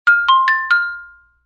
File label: notification